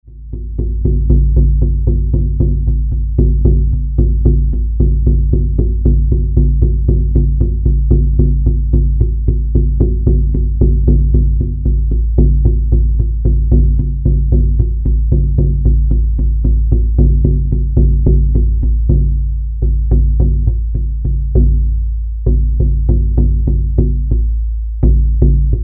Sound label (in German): Earth Drum (mit Rückholsignal)